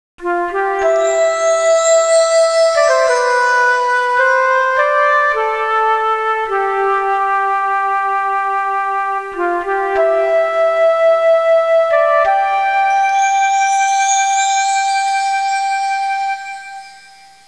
musica newage; Suoneria

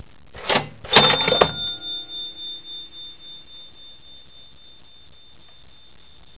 suonerie businessy

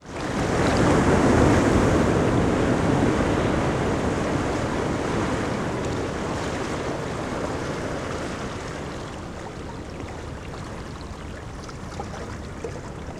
acqua water, natura

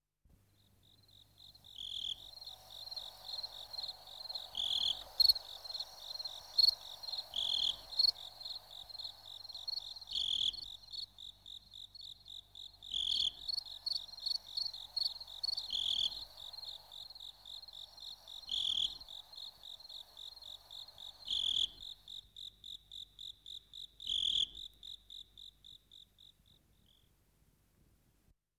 insetti insect; animali

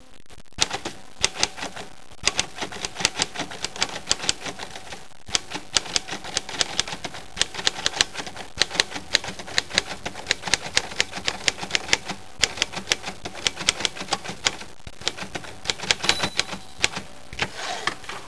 musica elettronica; electronic

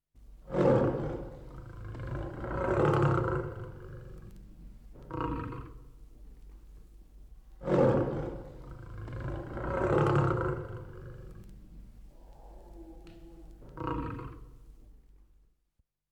tigre tiger; animali felini

